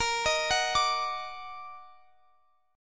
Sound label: D sharp Minor Major 7th A sharp